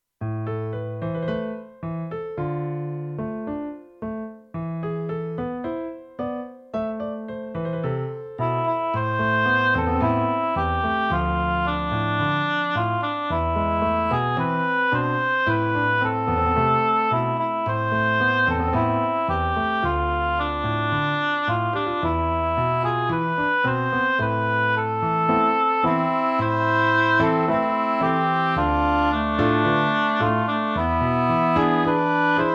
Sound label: piano